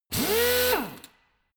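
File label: impactor soft long, drill; maschinen; bohrer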